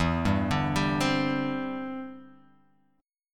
E Minor 7th Sharp 5th, down; arpeggiated

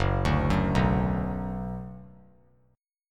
Gb Suspended 4th Sharp 5th Gb, down; arpeggiated; JGuitar

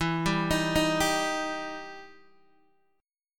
E Minor Major 7th E down Guitar DADGAD

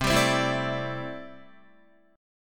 C 6th Add 9th C down Guitar; C6add9 strummed

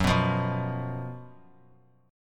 Gb Major 7th Suspended 4th Gb; GbM7sus4; strummed; JGuitar

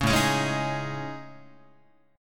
A Major Sharp 11th, Guitar; AM#11; strummed; JGuitar

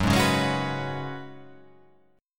Gb Suspended 2nd Suspended 4th; Gbsus2sus4 strummed; x,9,6,6,8,8,8,8

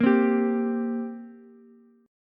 G Minor Double Flat 5th, down, Ukulele; strummed; JGuitar

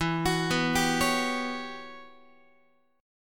E Minor 6th Add 9th guitar chord; Em6add9 arpeggiated; JGuitar

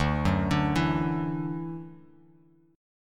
D-Major Add 9th D down; arpeggiated; JGuitar

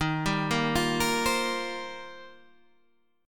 Eb 6th Add 9th, Guitar Standard; arpeggiated; 11,10,8,10,11,8

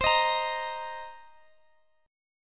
C Minor 7th, Guitar, Open F; strummed; Cm7; x,x,x,19,18,17

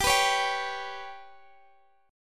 B Suspended 2nd Sharp 5th

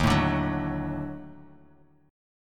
D sharp Minor Major 7th Flat 5th D sharp; strummed

